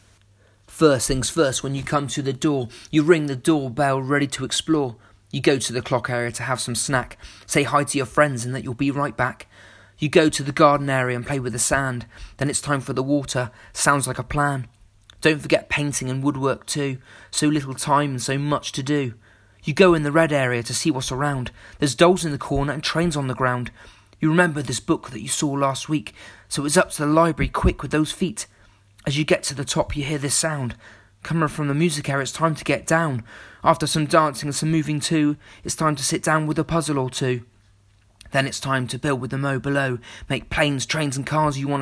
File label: rap